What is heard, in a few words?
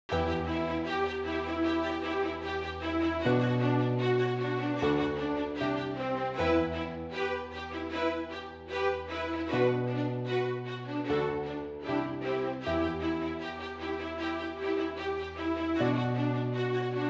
holiday jewelry commercial